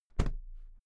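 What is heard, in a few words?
briefcase close, Büroarbeit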